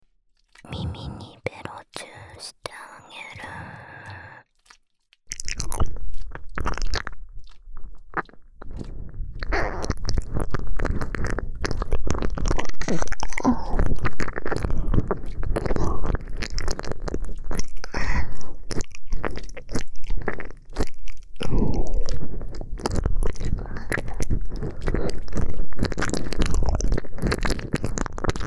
囁き耳舐め手コキ 耳舐めべろちゅー; 第10作サンプル ギャルJKと援交えっち！?

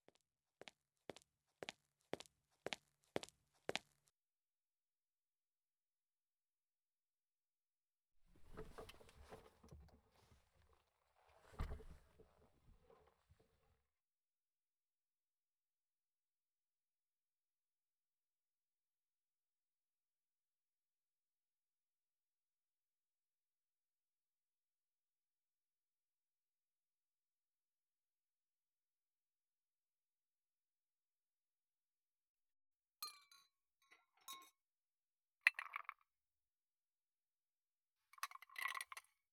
スキンシップ ASMR; ２人きりで乾杯

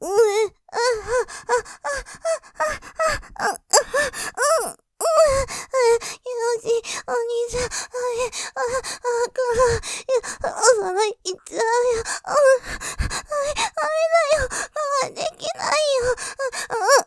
絶頂寸前 あぁ、気持ちいい; おまんこ、いっちゃうよ; モノラル; ループで楽しむ！シェフの気まぐれ抜き抜きコース

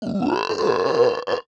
魔物 ダメージ

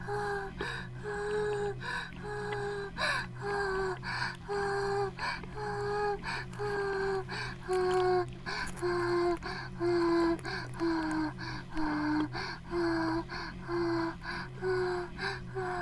バイブ放置; イクたびにわけて

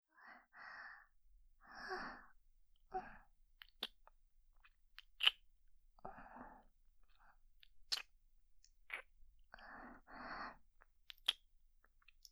キス音 ループ; いっぱい甘やかしてくれる優しいお姉ちゃん♪

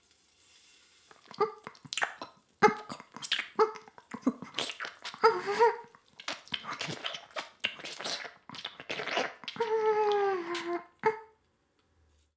フェラ音声; 古き城のドラゴニート2

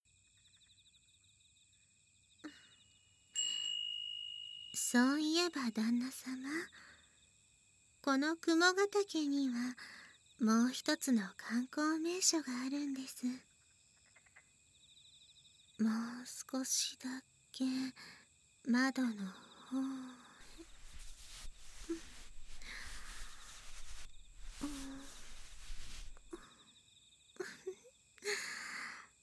膝枕と口付け

